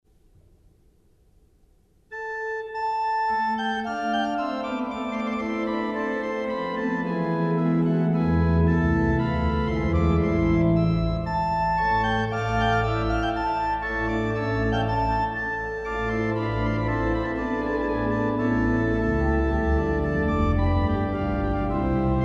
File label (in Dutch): Prestant met Subbas en Bourdon